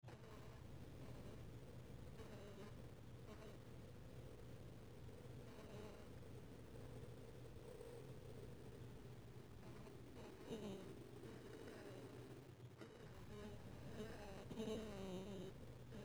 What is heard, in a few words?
buzz